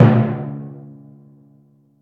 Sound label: ambient tom one shot b key